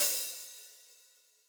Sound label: open hihat